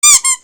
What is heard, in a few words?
squeak